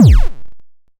bass drum

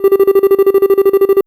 phone

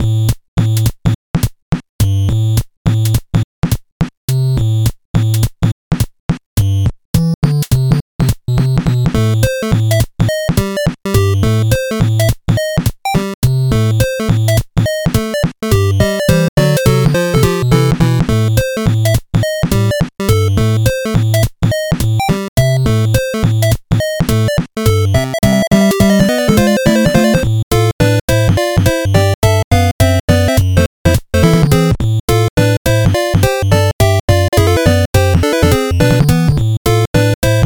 8bit

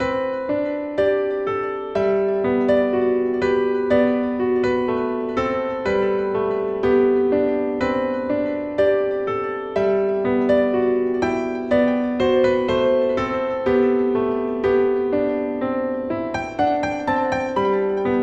piano

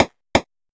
zaza

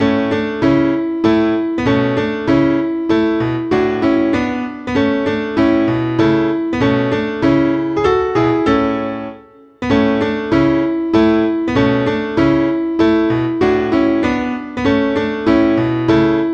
piano

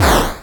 enemy down

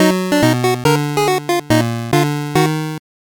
gameover

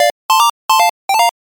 gameover

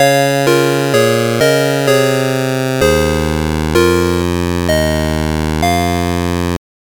gameover

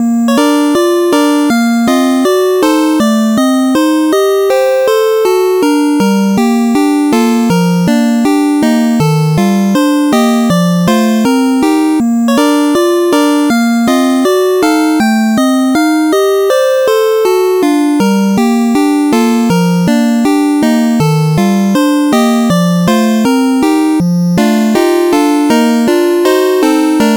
8bit

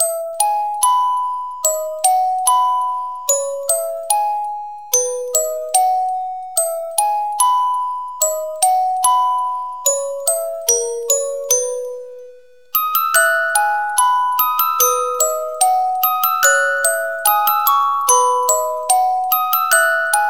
music box; MUSIC BOX background music; オルゴール

